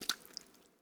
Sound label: Footsteps Puddle One